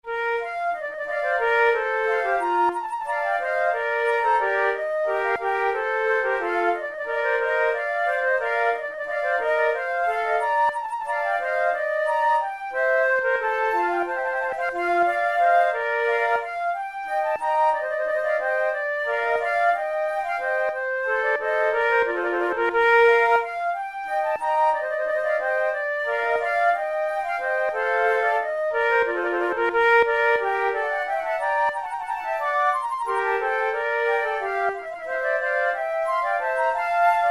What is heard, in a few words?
flute sonata, andante; Mattheson; flute tunes